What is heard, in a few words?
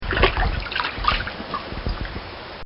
Slowing Pour Water In Bucket; Nature; Bobby Cole